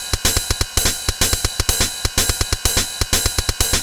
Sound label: drum loop scatter hat 125, Classic Chicago House; Sample Magic